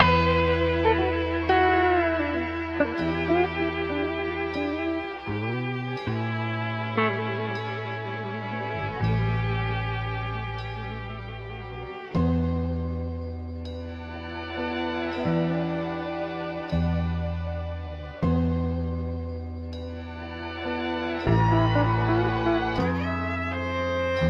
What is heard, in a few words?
guitar and strings, Sad